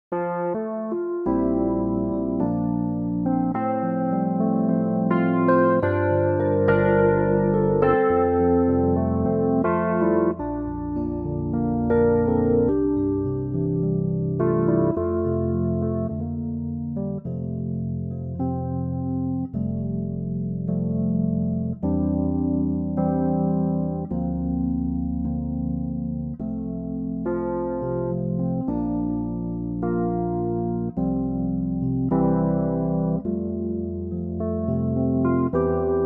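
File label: F electric piano; Pianotrak; key - F - vocal range - D to G; Arturia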